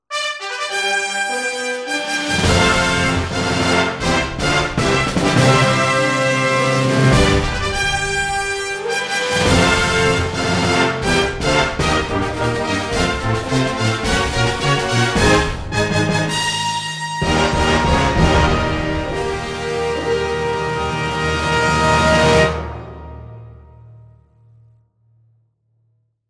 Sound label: Fanfare Dubai; Champion Jockey: G1 Jockey & Gallop Racer; Xbox 360